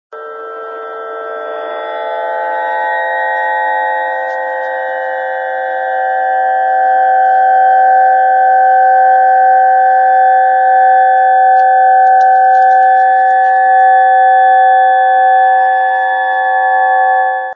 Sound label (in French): harpe eole